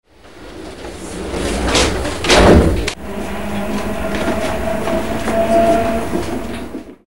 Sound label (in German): fahrgeraeusch